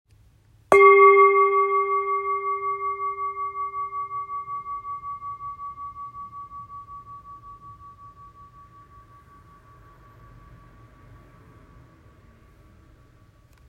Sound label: Japan bowl, traditional japanese temple bowl rin; 寺院鈴